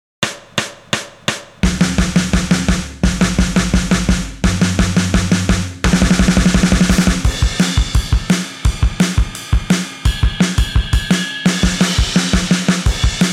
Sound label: drums, 171bpm